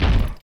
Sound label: new wolf walk